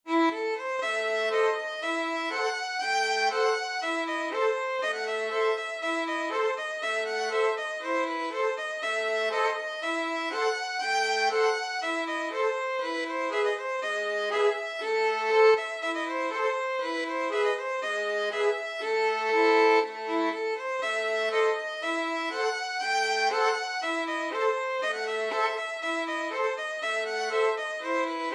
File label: bulgarish violin trio; odessa